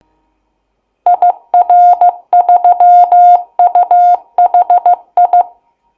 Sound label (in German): Kennung CW; Gitschberg